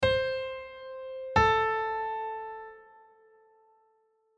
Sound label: d minor 3rd; Minor Third: Imperfect Consonance